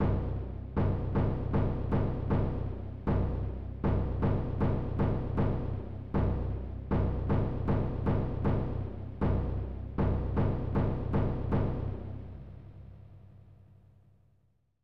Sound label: Destroyed Cluster of Enemies